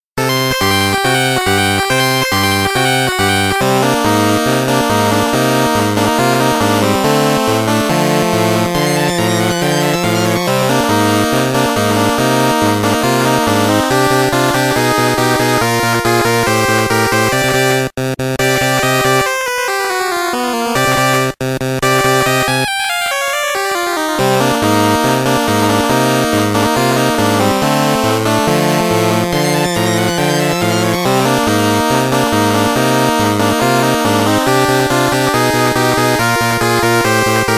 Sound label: short stage bridge theme; gargoyle s quest